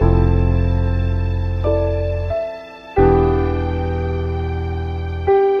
piano and strings, Sad Loops

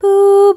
ooh SUNG, Voice

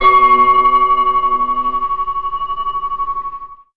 meditative, bells; Notes

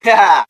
ha ha ha; Voice; ooh ahh

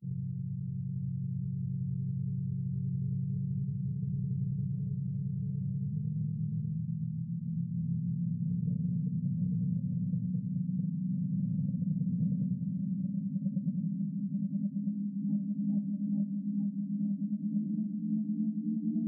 space ship, Zaps and Beeps

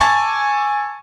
dissonance, Piano

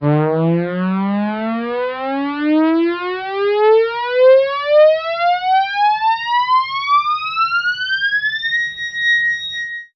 slowly rising sine wav; Zaps and Beeps

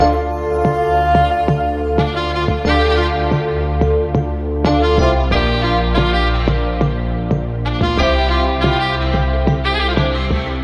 sax and keys, Phonky loops

